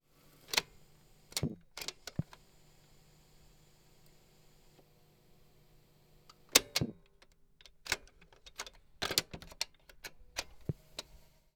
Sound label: cassette tape start stop